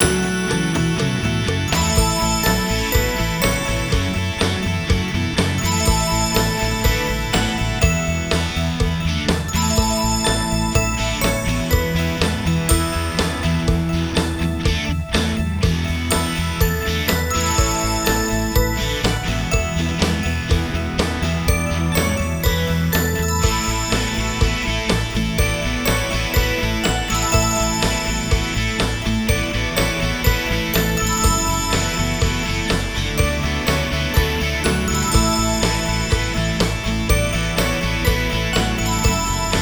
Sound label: Reindeer 123bpm, Loops; audiocam music